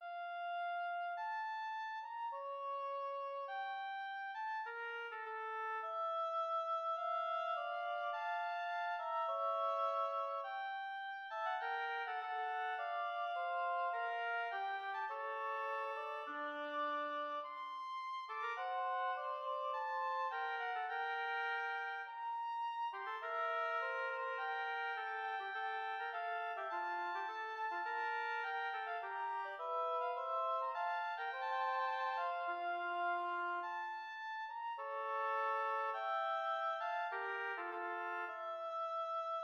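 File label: lentement oboe duet; philidor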